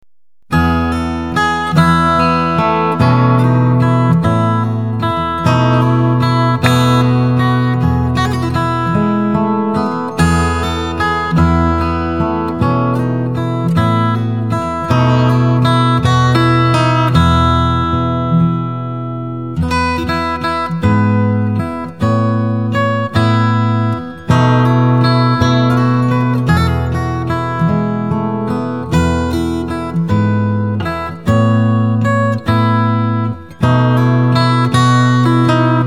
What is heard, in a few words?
waltz